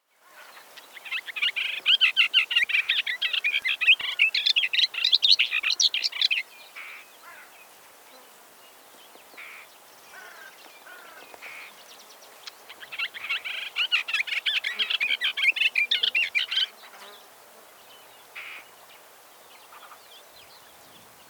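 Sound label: severnaya bormotushka golos, booted warbler voice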